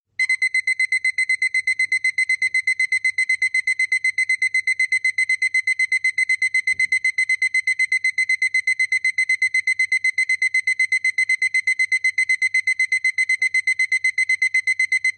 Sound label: Annoying Alarm